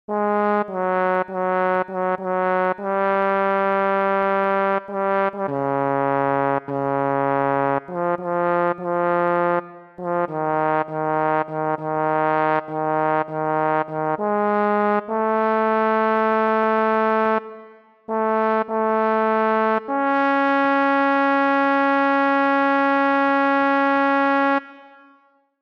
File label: Bass, barbershop tags